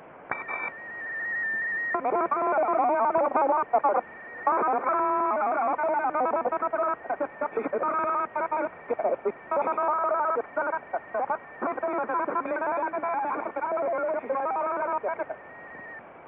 EGYPTIAN MILITARY / VOICE SCRAMBLER; (MODIFIED VERICRYPT 1100?); NAFRICA

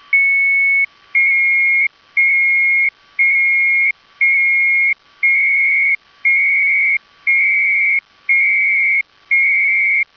single tone

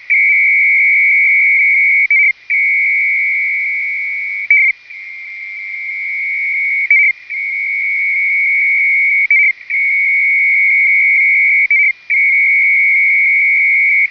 G-TOR 200Bd, G-TOR FSK; G-TOR station running 200 Bd; FSK-systems

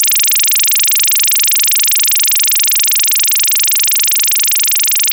OTHR 25, OTHR SYSTEM 25 Hz PULSING; OPERATED FROM CYPRUS; TX-SITE IN SPAIN

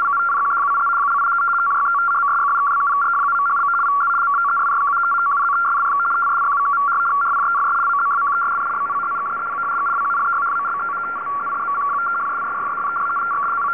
RUS-NY 75Bd-250, CIS-MIL 75Bd; CIS-MIL 75 Bd FSK system 75 Bd/250 Hz shift; ENCRYPTED PSEUDO# BITSTREAM; FSK-systems

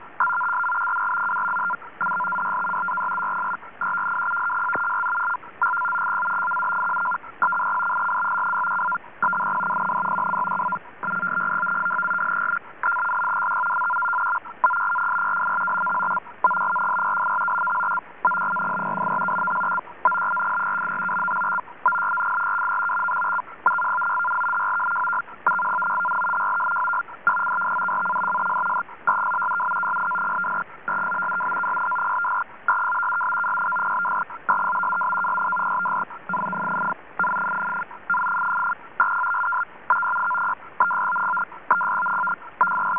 ARQ SWE, SWE-ARQ

